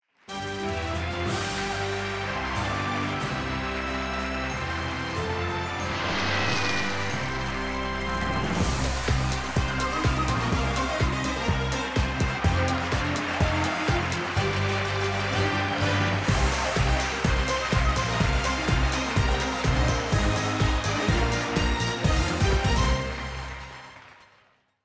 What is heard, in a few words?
Intro Fanfare; Buzz Brain of the UK